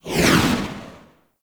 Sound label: Motorcycle Passby Whoosh Medium; Warframe; Motorcycle Ambience